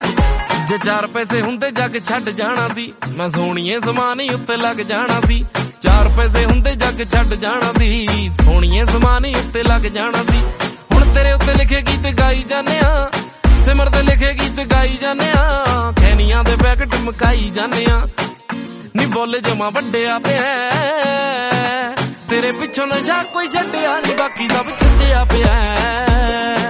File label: punjabi song